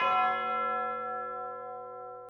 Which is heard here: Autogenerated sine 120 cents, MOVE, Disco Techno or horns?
MOVE